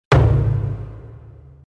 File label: drum